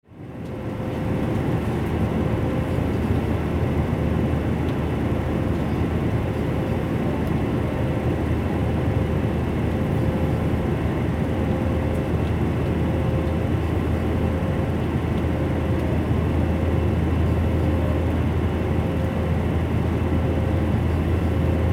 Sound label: a319 jet cabin inside